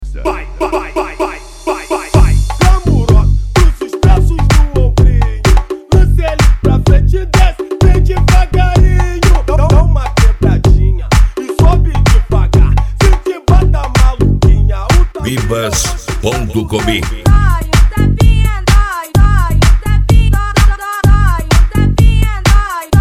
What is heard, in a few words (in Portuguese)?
sound car carnaval